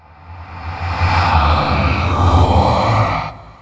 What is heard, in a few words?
incant; warlock